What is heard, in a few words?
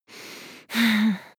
breath female aroused